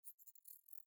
Decoding

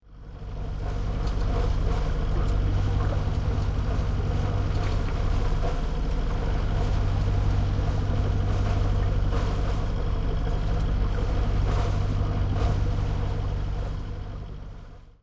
boat

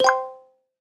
notification

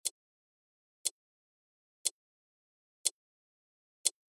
tick

